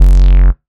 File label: Bass F#, techno synth shot; Bass One-Shots; Ghosthack; 45 Free Techno Synth Shots 2025